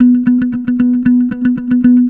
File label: guitar, stringed instruments